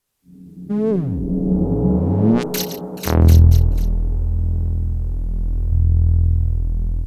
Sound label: Copshow FX; Copshow1975